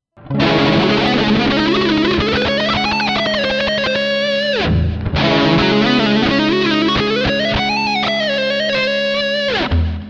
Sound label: shred, guitar